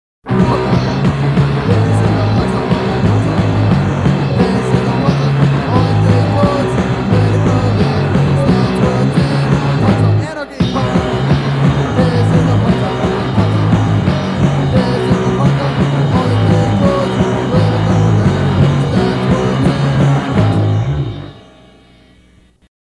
Punk Song

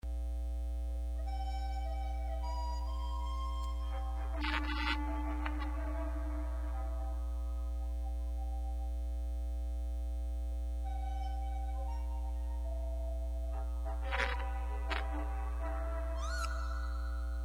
fanfare for whistle and balloon

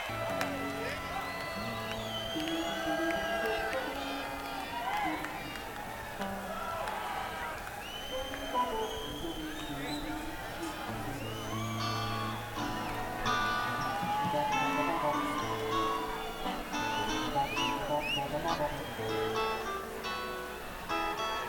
Crowd Tuning

